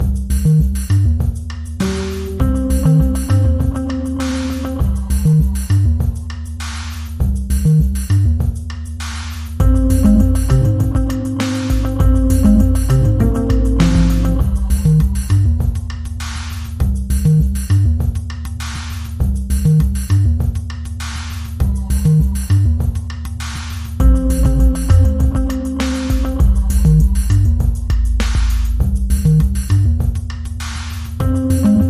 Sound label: Hop Reggae